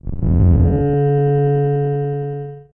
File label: Happy